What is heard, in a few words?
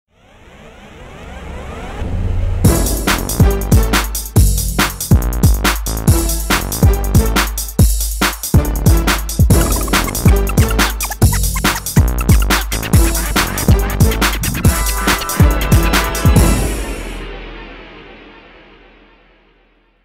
Close Call (Beat); NBA Live 06; PlayStation 2; NBA Live 2006 Beat